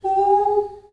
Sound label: DOVECALL, Heart of Africa; Spectrasonics; BIRDMEN